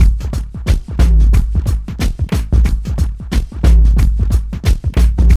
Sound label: TRIP 90, trip loop